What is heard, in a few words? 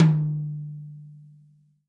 tom, Real Drum Kit, funk kit